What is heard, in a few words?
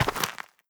step light, footsteps, player, dirt